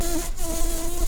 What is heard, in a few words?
Lapidarius queens, bumblebees